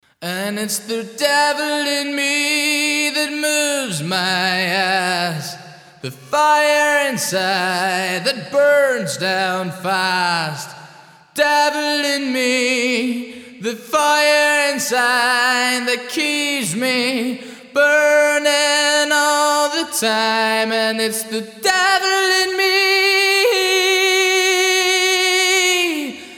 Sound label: Vox Large Brite Hall